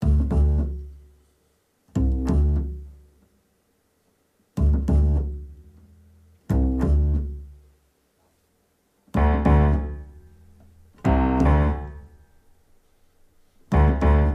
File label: Reduced Drums Reduced Arrangement